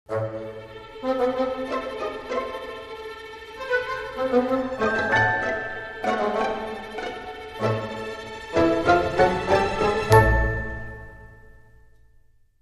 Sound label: Dramedy Sting Five, No Perc